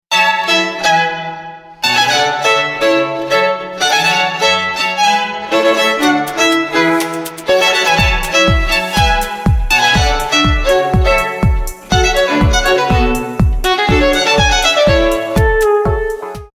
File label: String Quartet Pop; chambermusicplaylist